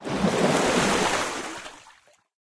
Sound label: step; smite; poseidon